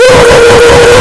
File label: intro scream